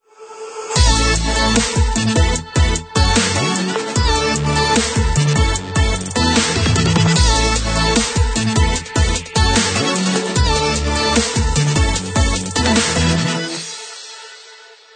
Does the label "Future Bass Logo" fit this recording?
Yes